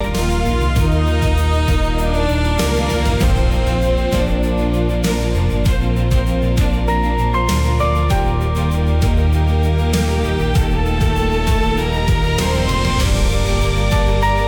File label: loop